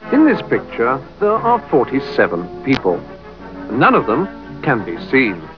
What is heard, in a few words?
People